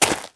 Step; Waterski; Neighbours From Hell Console Edition; GC, Xbox

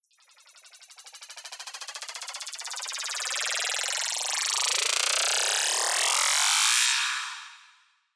Riser

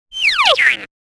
Pop down

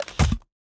PickUp Rubber

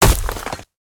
Dash Impact; Caffiend

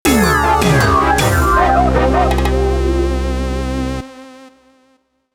Game Over; Azumanga Donjyara Daioh; PS1

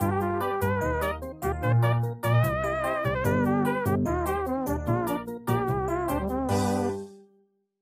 Elevator Jingle; Lethal Company

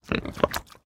Eat; Aporkalypse Pigs of Doom

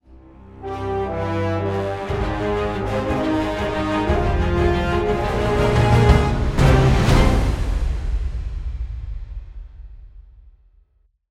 level ending; Space Rift Episode 1

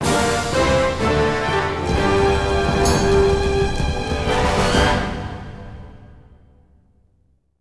Combat Win; The Hobbit; PS2

